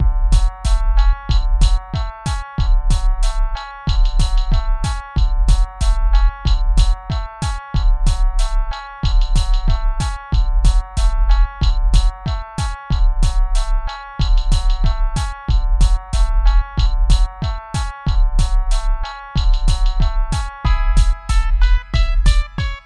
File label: Chill ring tone